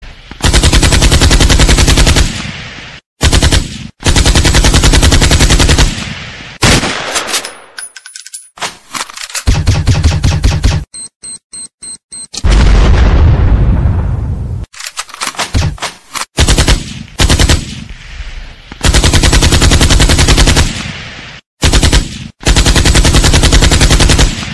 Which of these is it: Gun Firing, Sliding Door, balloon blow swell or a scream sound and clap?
Gun Firing